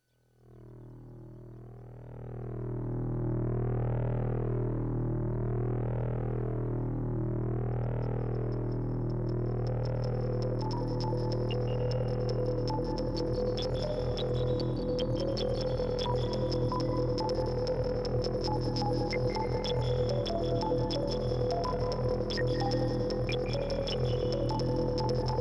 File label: little noise